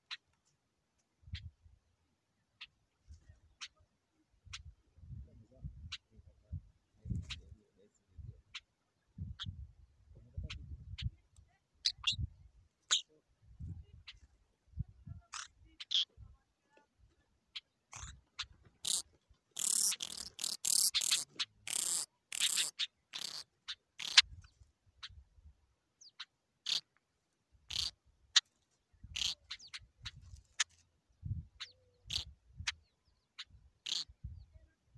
Capped wheatear

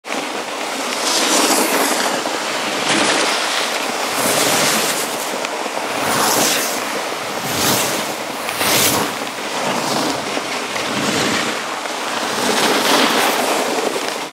Snow skiing; اسکی رو برف

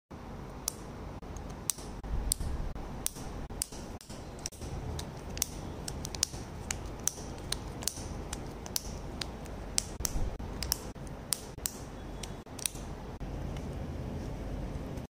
Opening and closing lipstick

